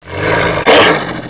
attack, Necromancy

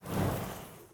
Burning Hands Whoosh Tails; Evolve; Meteor Goliath

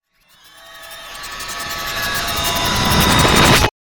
Lurker Ability Mimic Tell; Gorgon; Evolve

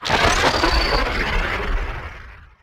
Nebula Cloud Prepare Start; Evolve; Wraith

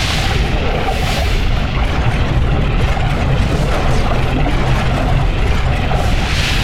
Banshee Mine Active Loop; Evolve; Kraken

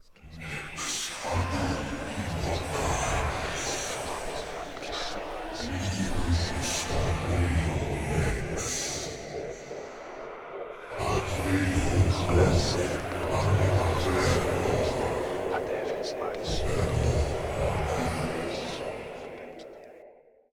corpse spell; Necromancy

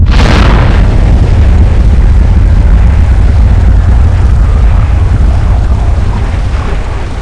volcano start

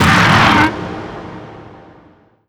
strider pain; Strider Remake

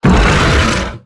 Exertion Heavy; Evolve; Behemoth

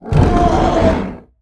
Pain Light; Evolve; Behemoth

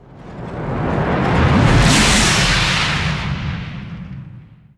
Lightning Strike Activate Start; Evolve; Kraken

